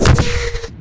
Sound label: strider minigun; Garry's Mod; hl2